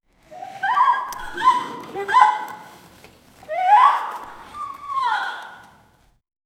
romantic couple dancing, romantic

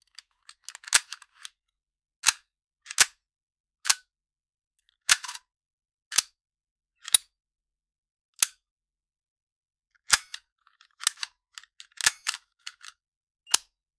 sound of reloading an ass, assault rifle

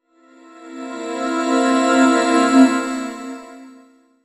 rising crescendo for loot

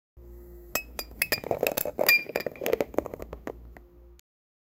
smooth and creamy ice cre, kitchen

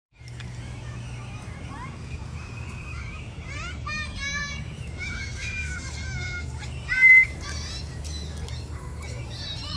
slip sound whoooo, kids laughing

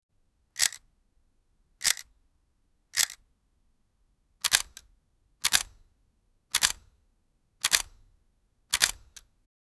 cinematic sounds of a camera

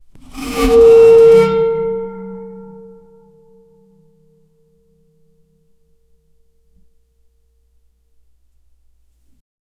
a realistic wooden bow dr; sound effect

